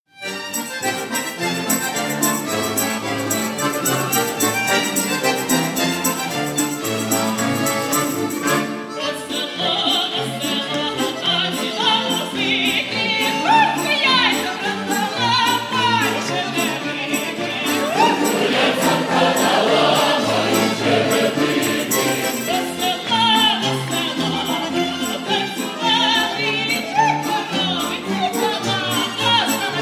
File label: instrumental